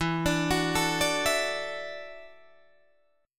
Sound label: E 7th Suspended 2nd Suspended 4th, Guitar, DADGAD